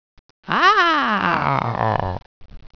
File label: pain, dragon